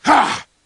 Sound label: hit heh; superheros; the hulk